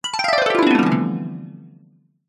fantasy magic wand spell classic harp glissando descending fairy; zapsplat